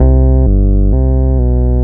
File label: BAS, 2 Step